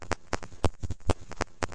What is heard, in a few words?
noise interruptions; LOOPING AMBIENCE